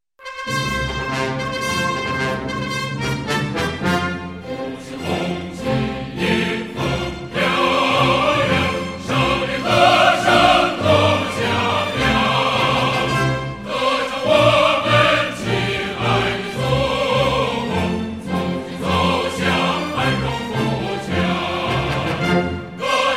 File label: ringtone